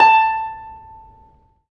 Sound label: STR HARP, STR Orchestral